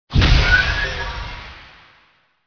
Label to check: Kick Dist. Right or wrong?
Wrong